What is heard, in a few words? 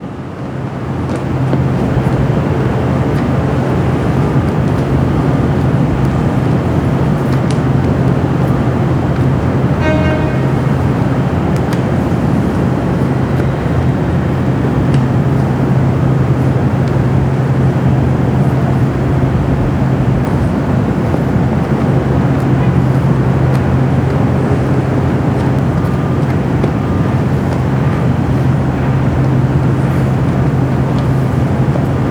Shepard